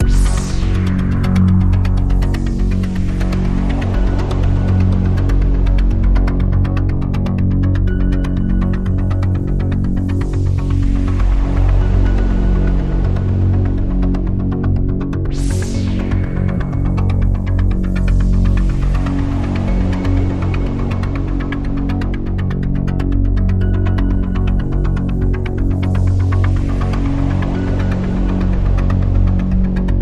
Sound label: Radio Toolkit Pad